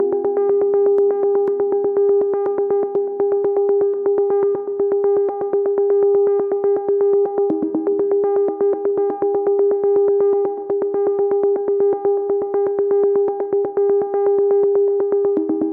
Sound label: 122 BPM Arps A maj G min Tonic G; arp essentials